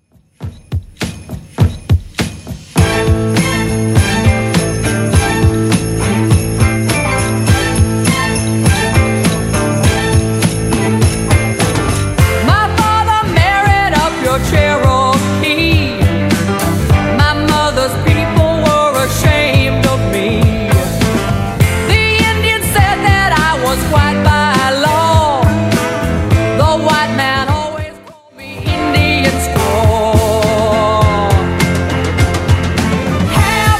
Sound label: 70s Redrum 102 bpm